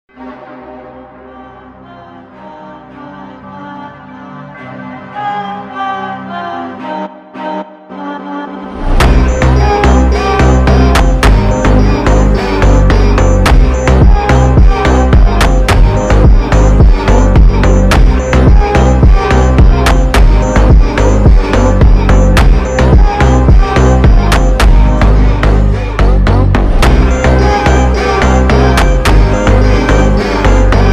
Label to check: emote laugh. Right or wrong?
Wrong